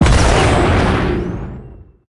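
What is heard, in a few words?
power portal; smite; heimdallr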